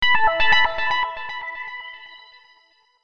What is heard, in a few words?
ALERT